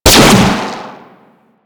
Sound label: Raptor Fire 3D, Weapon Fire; Warframe